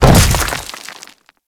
Grineer Land Dirt, Footsteps; Enemies; Warframe

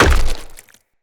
Blunt Heavy Impact Flesh; Weapon Impacts; Combat

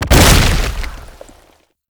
Tank Infested Melee Impact; Enemies; Abilities; Lasrian Tank; Warframe

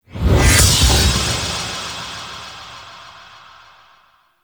Chimera Sword Assembly Pulse; Warframe; Chimera Prologue

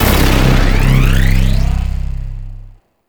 UI Login Reward Activate

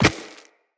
Walk Rock, Footsteps; Operator

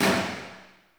Tail Generic Interior Medium; Warframe; Weapons

